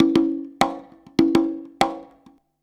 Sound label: CONGA, Modern Percussion Loops